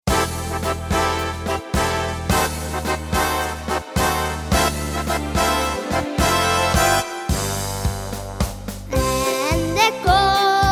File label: niggun